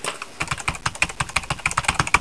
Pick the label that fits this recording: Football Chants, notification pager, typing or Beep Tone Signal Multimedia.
typing